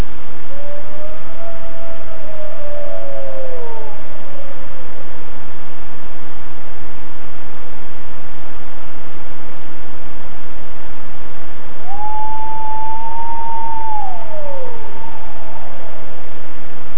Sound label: echo